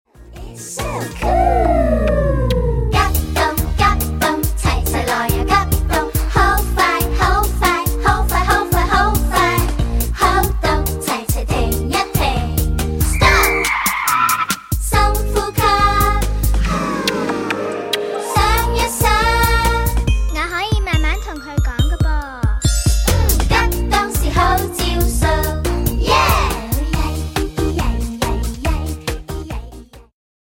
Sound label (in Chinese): 無對白, 正向心理兒歌